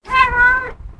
Cat; افکت صوتی

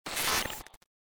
Cinematic Bad Signal; افکت صوتی

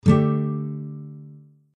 guitar

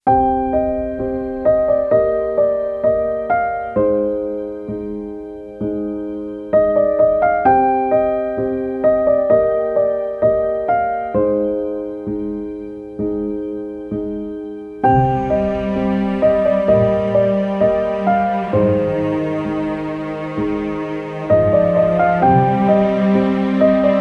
Sad Film Score

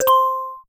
notification mobile

